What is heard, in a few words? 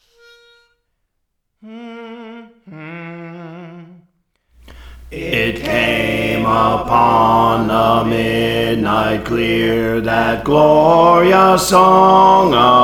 Bass; Praise For The Lord Training